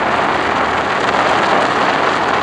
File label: mud